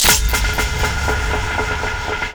Cave Figurine Assembly; Warframe; Duviri; Cinematics; Doll Assembly; Teshin

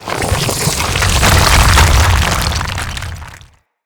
Burrow Ability; Enemies; Infested Bone Blade

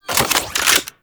Burst Gun Un Equip; Warframe; Archwing

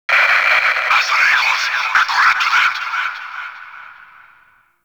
Fortress Exterior Notification; Warframe; Ambience; Grineer Fortress

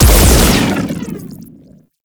Overhead Long Smash Attack Impact; Enemies; Warframe; Grineer Nightwatch

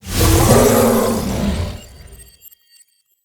Stag Wild Exclaim Summon; Powers; Stag Pact

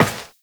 Step Metal, Footsteps, Walk; Warframe; Tenno

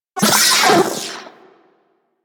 Vocal Death; Warframe; Corpus; Mini Moa

